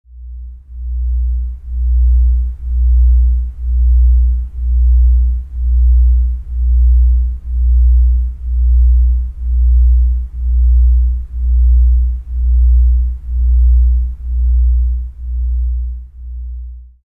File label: Binaural Beats 1Hz Delta Wave; with noise